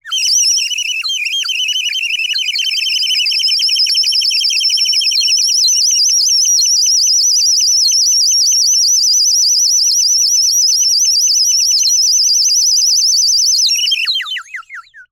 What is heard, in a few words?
Bird whistle, clay, painted, water, airy, wispy, flurry, insanity, very strong, x long, build to constant; Eiravaein Works; Vaeyan IV